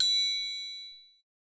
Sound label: jewelling a tool; Camas; jewels